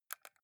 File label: console controller xbox joystick press fast